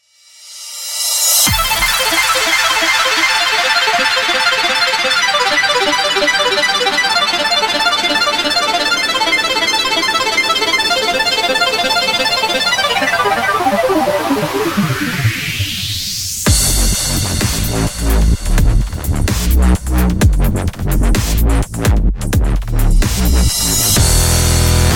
Electro Nation; Noisefactory; No Sylenth Vol1

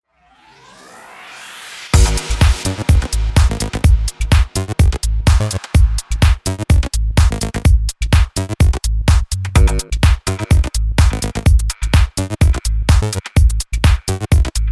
Minimal House Progressive Drums; Deep House Techno Tech House; Thomas Penton Complete Essential Series; Loopmasters

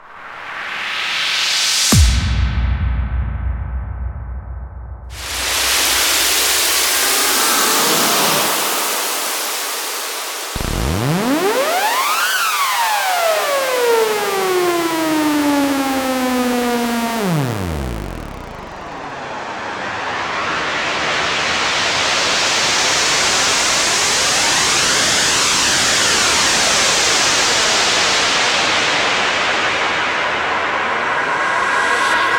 uplifter; wafx; SFX Collection Vol 1

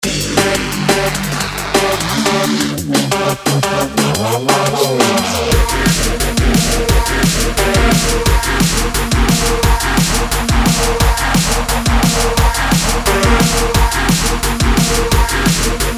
Drum n Bass; Drum and Bass Breaks; BHK; Rough Connections Vol1; Loopmasters

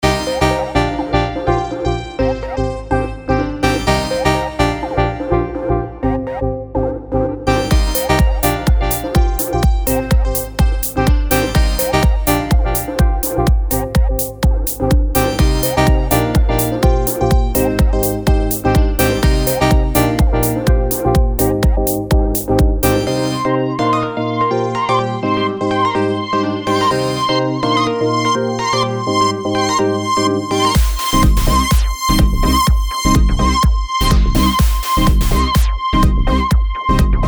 House Synths; Andy Lee; Predator

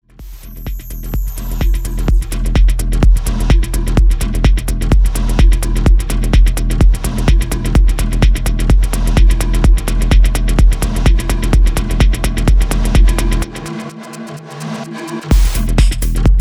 128 Minimal Underground Techno; DJ Tools Minimal Techno; DJ Mixtools 01; Loopmasters

